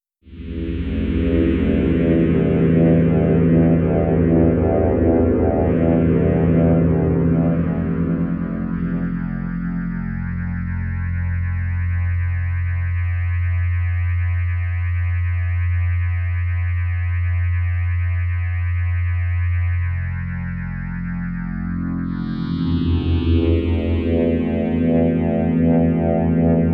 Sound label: Tribal; portal 2 mind escape mod